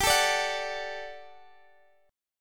G Major 7th Suspended 2nd G, Guitar Half Step Down